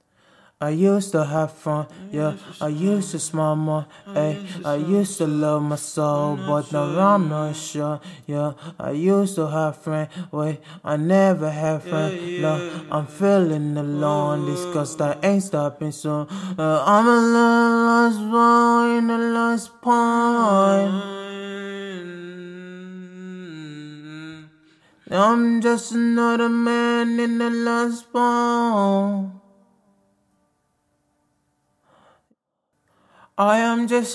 Lofi BPM116 Key B, acapellas

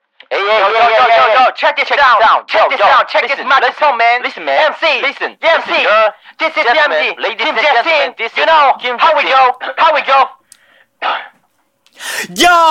Korean Grime Man Trap, trap, 140, Ebm; acapella

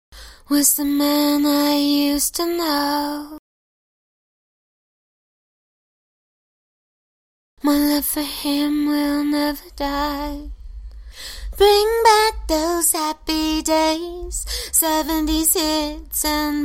130 BPM Key Cm, acapellas

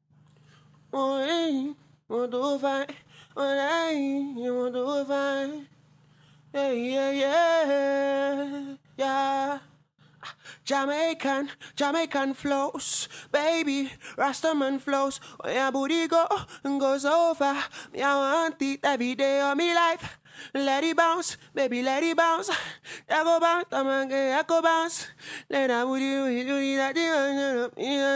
pop rnb 98 EM

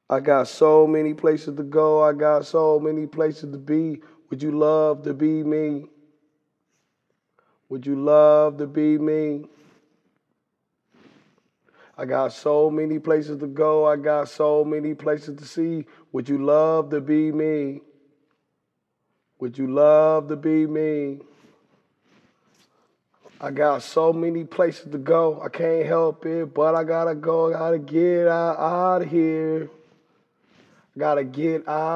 lo fi 172 D sharp, Acapellas

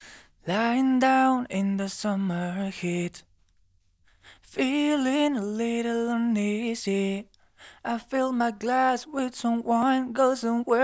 Pop EDM Dance 118 EbM

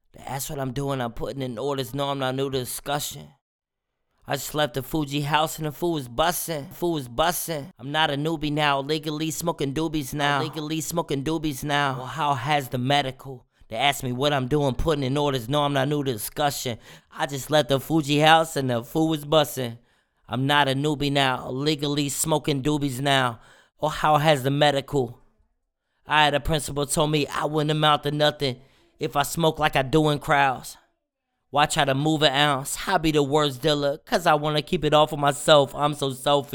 178 Ebm

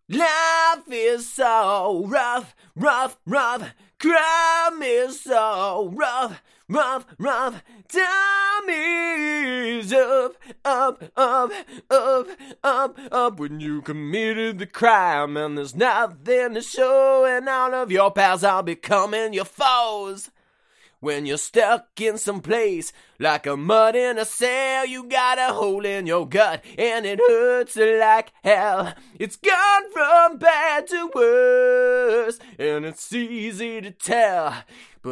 pop rock 122 Am

